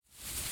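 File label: Footstep Foliage Bush Big Reverse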